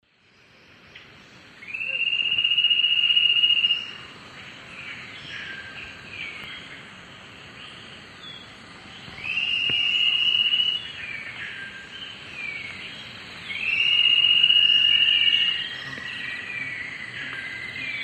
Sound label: Ramphocaenus melanurus, songs 2-3 birds; S. America; Eastern Lowlands